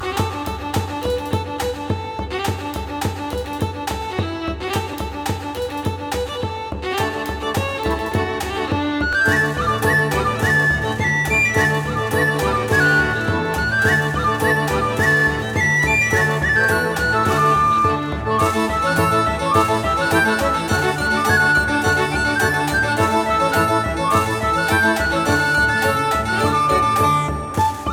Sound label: Menu Music; Puzzle Pirates